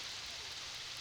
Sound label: Background Noise